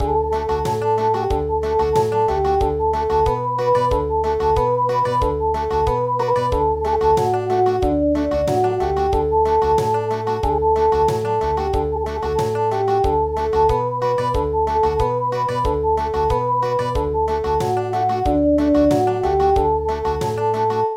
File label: Country